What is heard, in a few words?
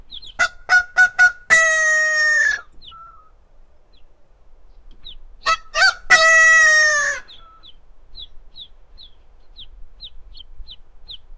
the very rare white long, avian